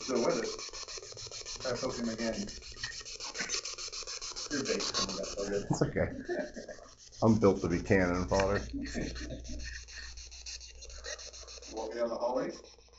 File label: 5th Floor Walking Spirit Box